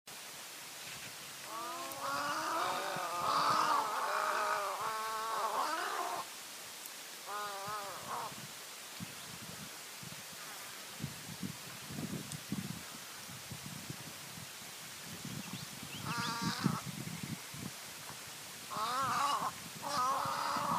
Silkeshäger

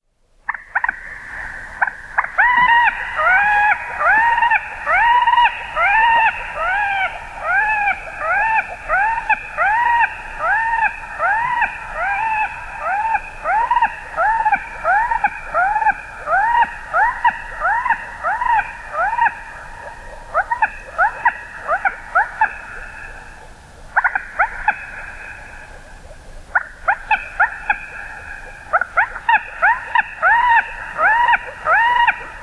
Rörhöna

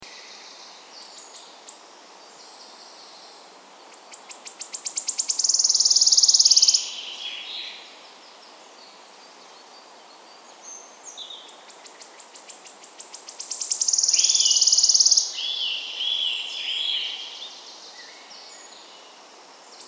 Grönsångare

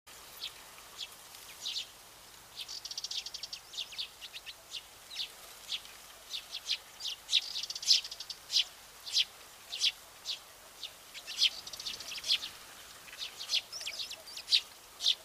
pilfink

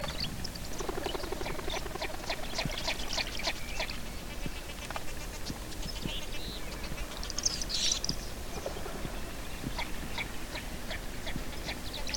Skedand

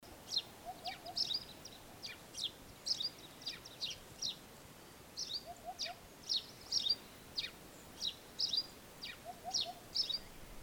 Gråsparv

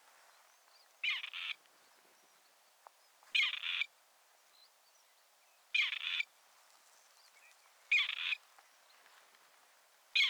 Rapphöna

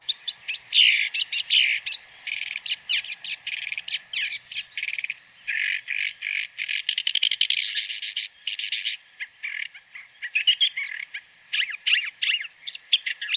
Rodhuvad tornskata

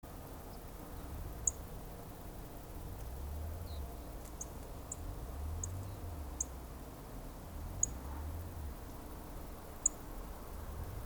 Videsparv

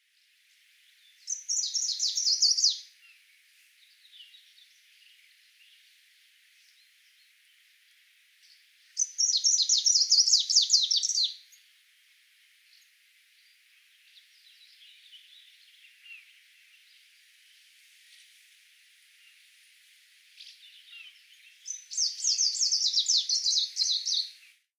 Lundsångare

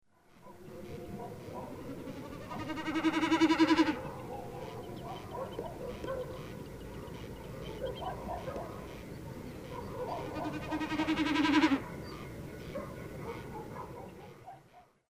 Enkelbeckasin

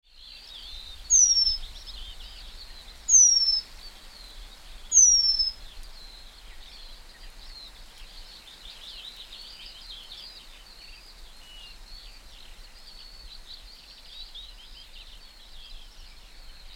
Pungmes

